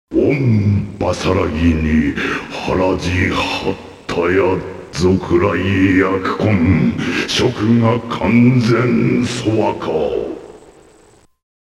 spell; Shin Onryousenki PC Engine Original Soundtracks 2008

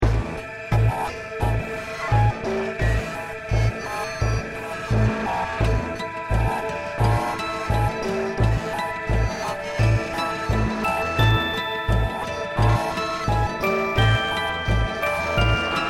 bgm zone field; zone5; goken

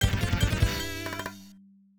Lottery Win

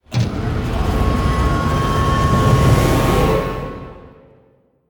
stinger loot riser; heroes of the storm full gamerip 2015

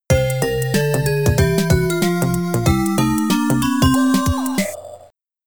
Jungle Game Over; brian the lion; amiga

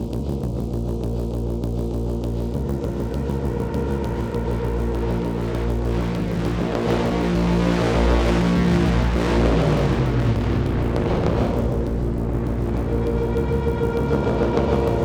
Music Tension Pulse Med Tempo; Battlefield 3

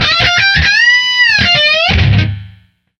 Guitar shred; Back to the Future: The Game; Ep. 1 It's About Time

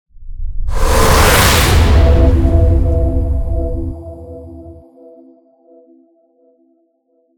Transition; everspace 2

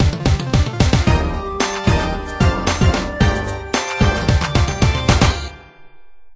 Game Won; Kobe Bryant Pro Basketball 2008

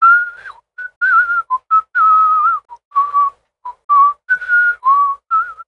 Whistling

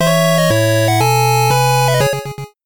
Fusion Success!; Monster Crown original soundtrack 2022

